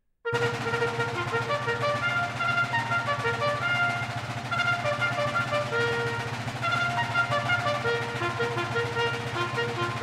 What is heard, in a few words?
Band Call